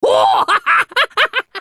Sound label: ultimate effort; Monkey King; character voices english